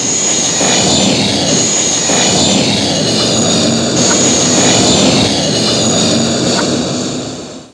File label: blast off; PUZMANIA